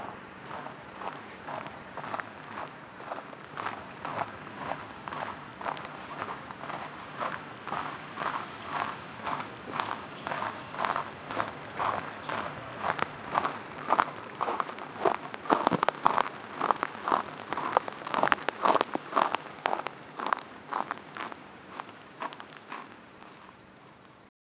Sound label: surveillance footage, bear